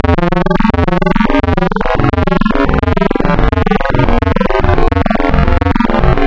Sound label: Spectral, wavetables; Architecture 32